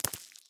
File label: spider, fst animal; skyrim